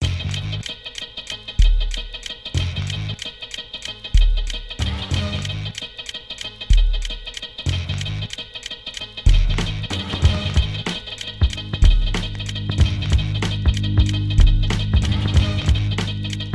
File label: beat